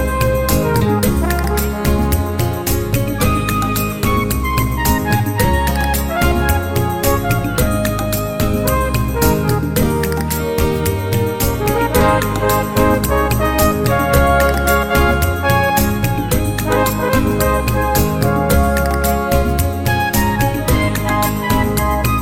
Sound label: Bolero